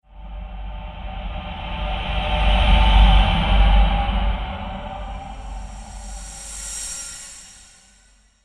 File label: Two Part Transition, Transition Sound Effects